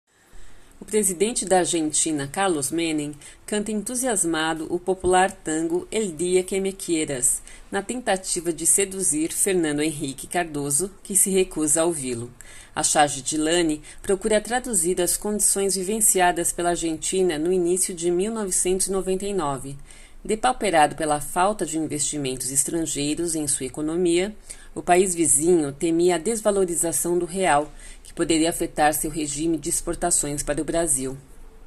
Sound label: tango